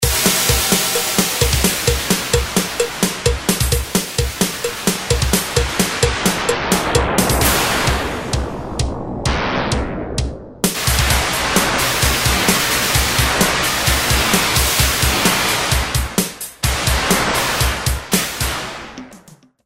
noise boom

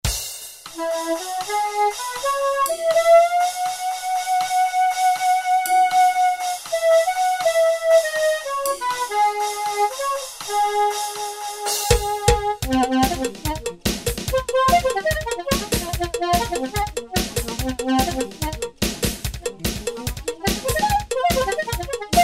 soprano sax soft

